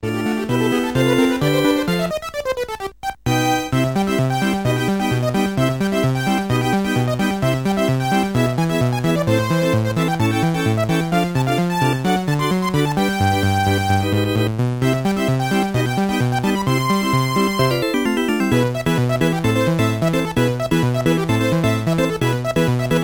Name Register; Namco Game Music Vol.1; Hopping Mappy

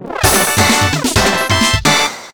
BGM Quiz Pass; Irodzuki Tingle no Koi no Balloon Trip; NDS

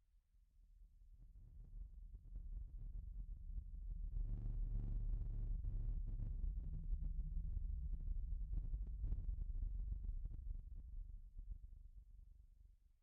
Deep Bass; Lethal Company; Windows

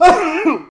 Sneeze

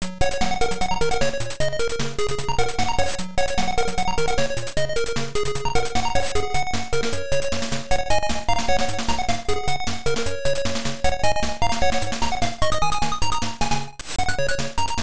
Surface BGM; Magmax; Arcade

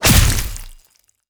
Boss Chain Damage; helltaker

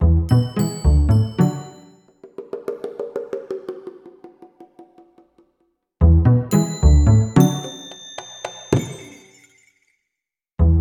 Lose Cup; Garfield Kart Furious Racing

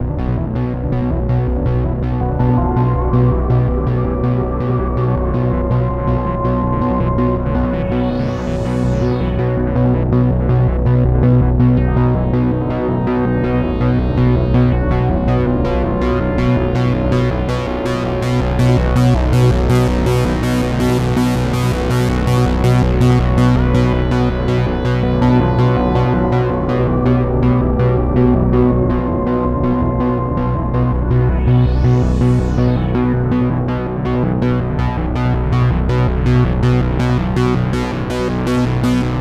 bossfight; the warriors 2005 ps2

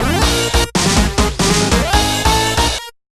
Jingle Win

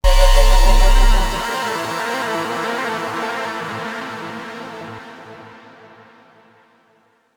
Sting; Stronghold

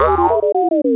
Wrong

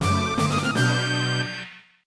Challenging Stage Start; namco nd 1 arcade; namco classic collection vol. 1

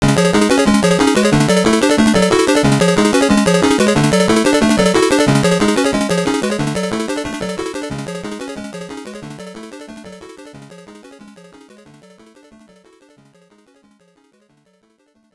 Timer Low

